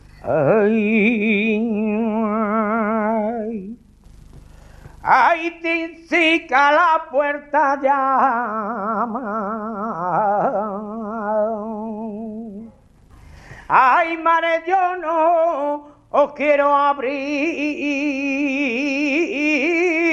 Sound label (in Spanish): martinete, flamenco